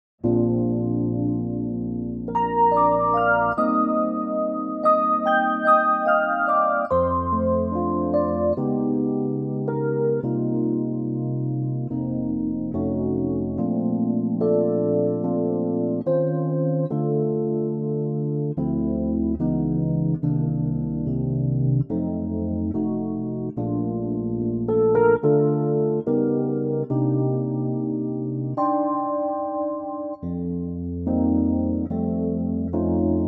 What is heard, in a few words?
Arturia